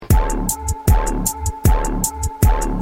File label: bass; made with Voicemod